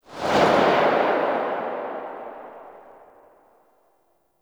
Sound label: Burst Rifle Tail; Weapons; Grineer